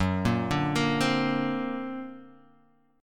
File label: D Augmented 7th F sharp, guitar